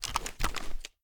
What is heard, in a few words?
draw; tacz; daffas arsenal